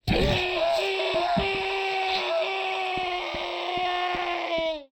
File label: gothic zombie death sound that goes on and on for eternity and its loud too